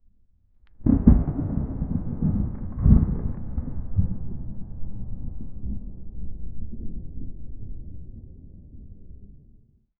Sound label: very distant thunder roll